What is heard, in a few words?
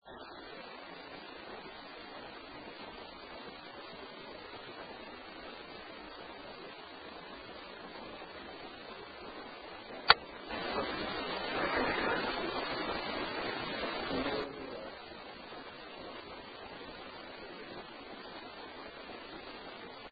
Attic CLICK; Case 021 Zepp Home; Unexplained; AND Breath AMP